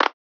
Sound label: Clap, Drum One Shots; Claps; Cymatics; Eternity Sample Pack